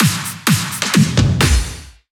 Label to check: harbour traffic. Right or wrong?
Wrong